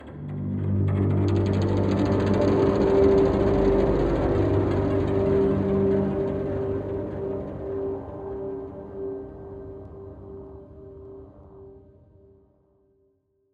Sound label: Reactorroom Transformers; SOMA